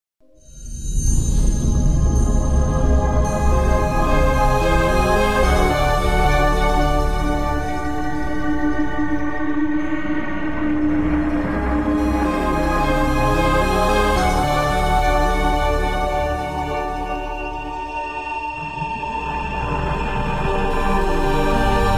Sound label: Teleport Swap Zone